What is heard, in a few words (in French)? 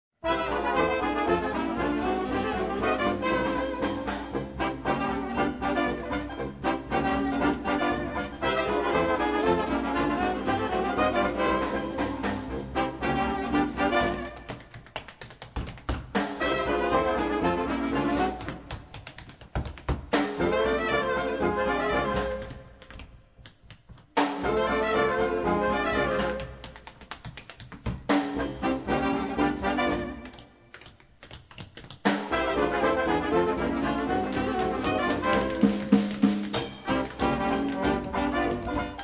concert spectacle cotton club show vieux jazz annees 1920 charleston claquettes